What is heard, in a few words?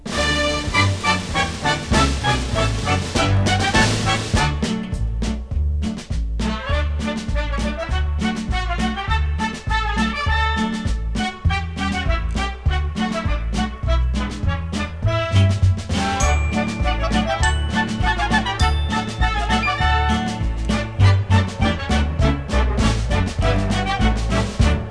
military; SoftKey Entertainment Pack; SoftKey Multimedia